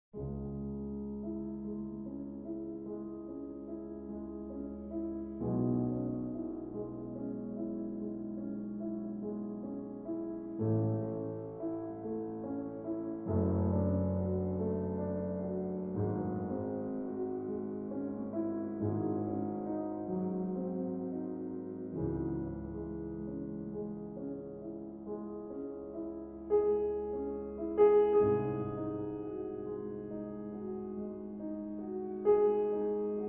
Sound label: Piano Sonata No. 14 in C-Sharp Minor, Adagio Sostenuto; Op. 27 No. 2 Moonlight